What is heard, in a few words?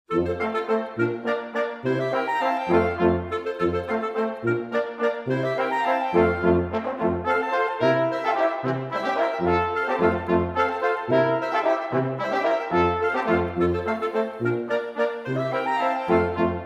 German Band; Detroit